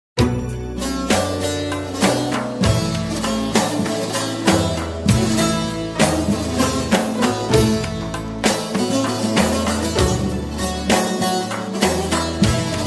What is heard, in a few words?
turkish, نغمة رنين موسيقى تركية; نغمة هاتف تركية